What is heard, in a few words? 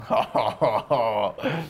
hohohoho laugh